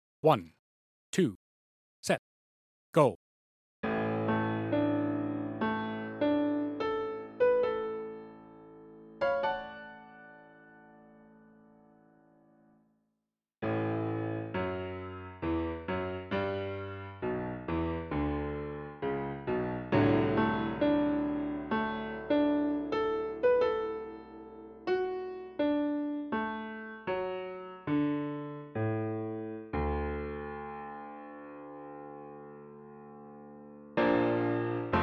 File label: 67bpm; Bozza Part 3; tmea solos